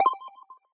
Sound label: coin; Subway Surfers